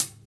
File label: HAT; East West